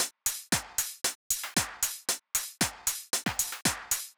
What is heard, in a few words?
Drumloop 115bpm, Nu Disco; Zero-G